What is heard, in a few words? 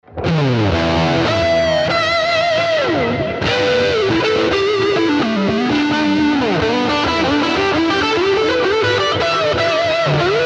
VALETON Dapper Indie drive tape plate